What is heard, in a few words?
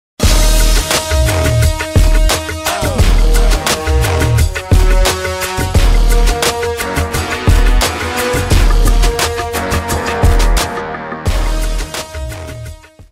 slam, round end music